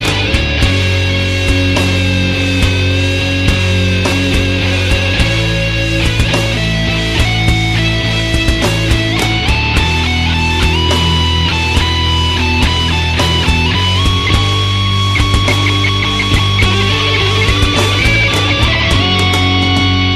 Heavy Metal

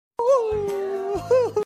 ooohhuhu

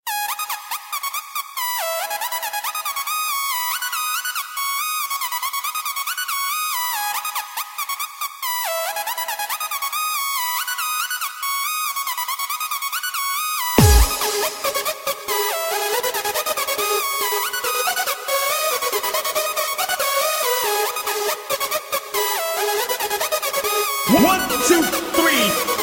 Jumpstyle Music

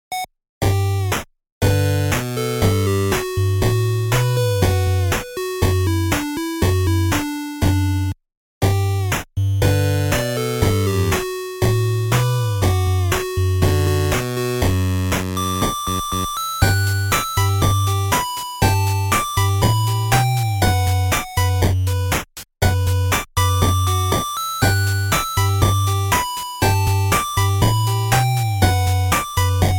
8-bit

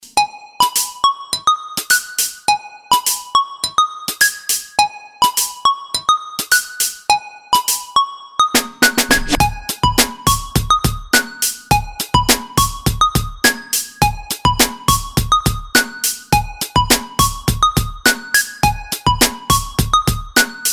Happy call

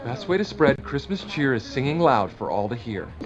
Christmas Cheer; Elf

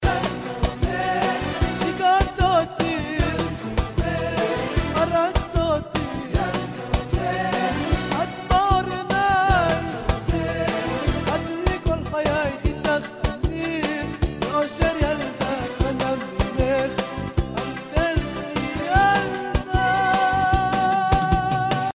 Girl Chorus

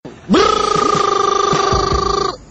Brrrr Sms

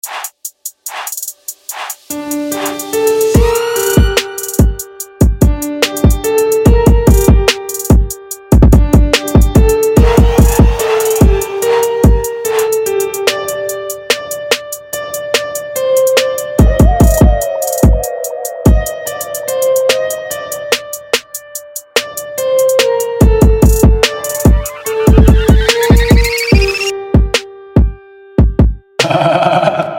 trapmix; Money heist

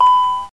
Master Warning

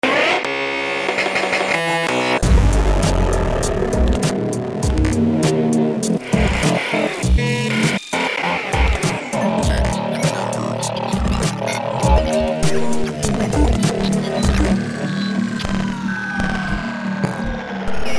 Glitch Tone

